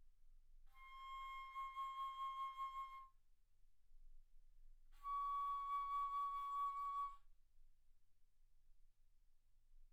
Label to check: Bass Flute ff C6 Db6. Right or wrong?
Right